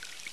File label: gas pour